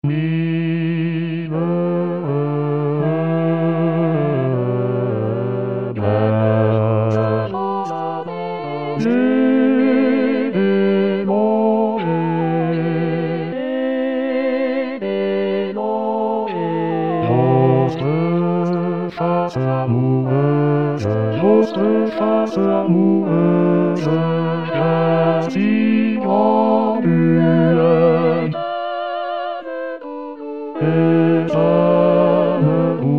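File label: bass; Mille regretz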